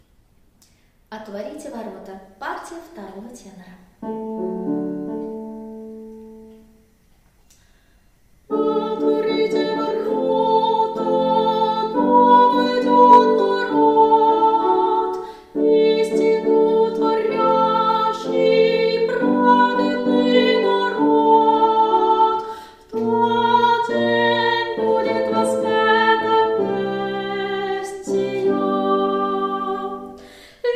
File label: tenor 2; otvorite vorota; epiphany 2017